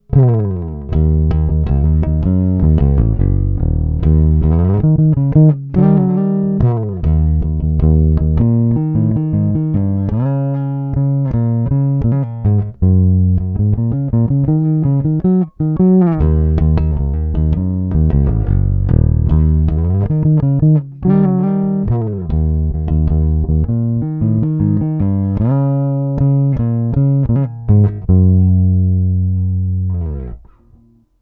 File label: Neck flat finger